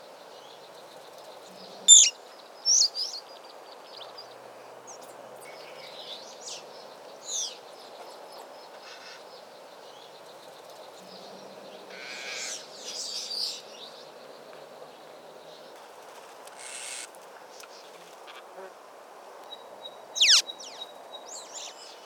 Black saw wing; پرنده اره بال سیاه

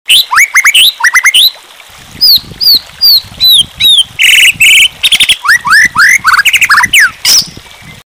Oriental magpie robin

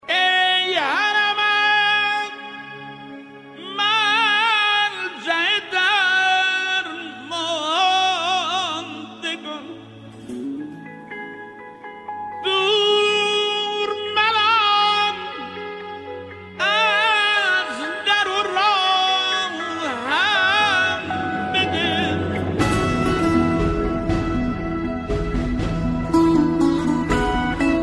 Ramazan Ringtone

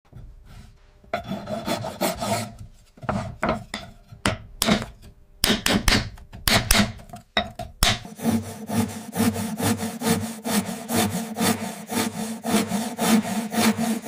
Mosaic; معرق کاری

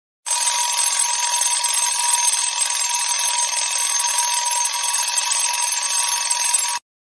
Desktop alarm clock

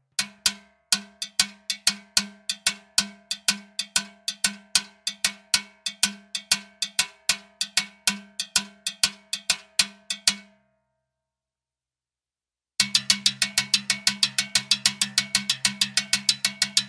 timbale

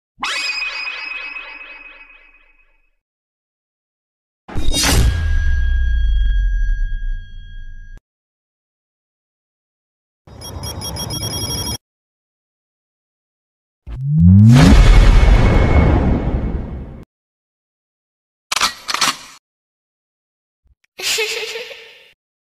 Mobile games

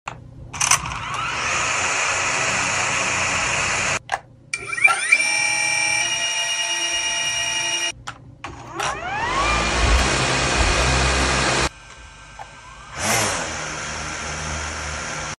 Quadcopter; کواد کوپتر

